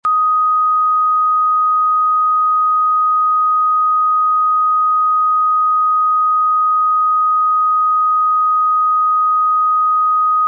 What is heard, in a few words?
1250Hz 0dB LR, test signal